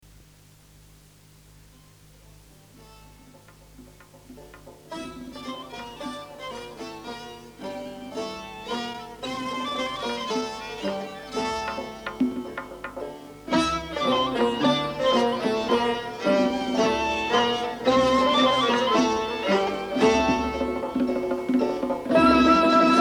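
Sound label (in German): Komposition für Orchester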